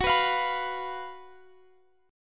F sharp Major 7th Suspended 4th F sharp, Guitar Standard; strummed; capo 9; chordshape; x,x,16,16,18,19